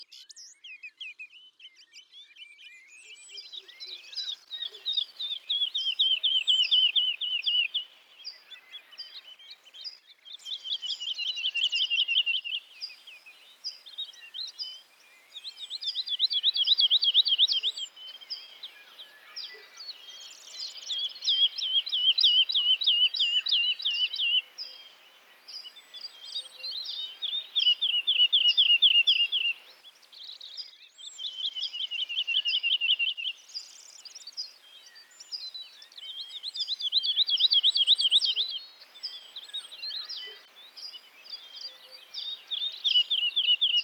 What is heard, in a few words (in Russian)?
penie zhavoronka